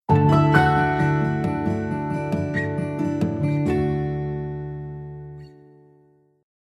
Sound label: Calendar, Nokia Alerts; Windows Phone apps